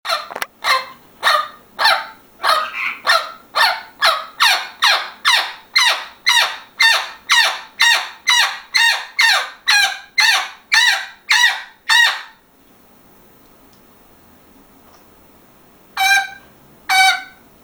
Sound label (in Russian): popugay parodiruet sobaku; zvuki popugaev